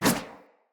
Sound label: Sword Whoosh; Weapons; Swords; Garren Sword